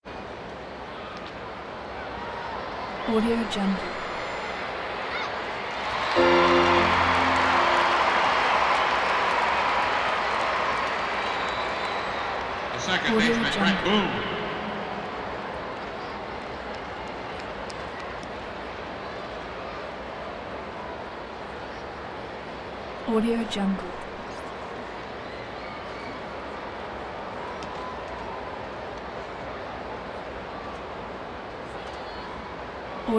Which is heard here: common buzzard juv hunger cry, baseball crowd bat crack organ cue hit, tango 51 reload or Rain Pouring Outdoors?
baseball crowd bat crack organ cue hit